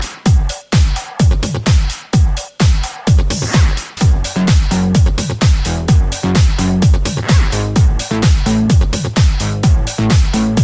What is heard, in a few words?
new russian dance